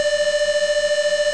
stall warning, aircraft cockpit

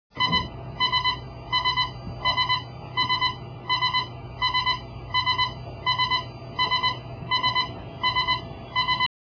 cockpit warning, aircraft

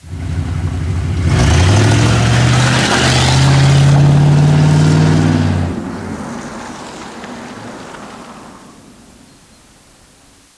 car accelerating

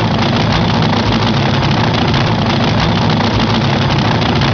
funny car idle

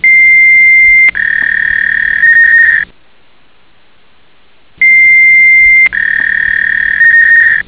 modem, computers

